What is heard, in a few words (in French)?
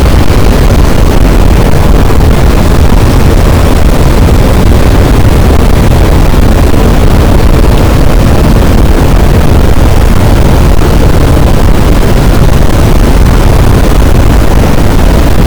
cachalot, boat; Physeter Macrocephalus